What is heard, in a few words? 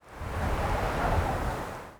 wind gust; ambient